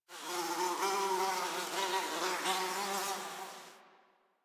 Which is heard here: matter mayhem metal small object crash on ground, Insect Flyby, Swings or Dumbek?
Insect Flyby